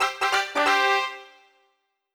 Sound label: Congratulations!; streetpass mii plaza; warrior's way